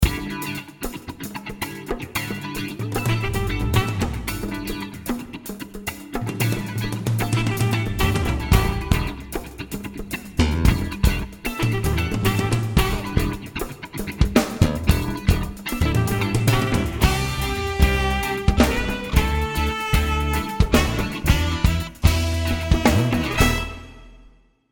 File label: jingle; montreux